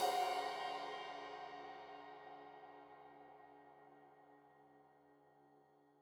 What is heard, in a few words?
Crash 15in L edge, Cymbals Stereo